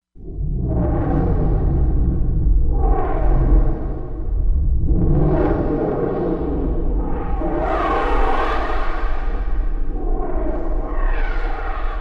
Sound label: SFX, Korg MSC-16S; Environment